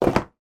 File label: game box lid put on; monopoly